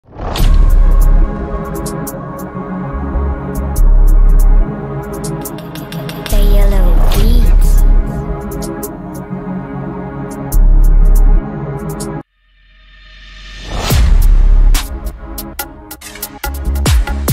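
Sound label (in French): Instru Rap Drill Freestyle Lourd Terrain Banger Dark Type Beat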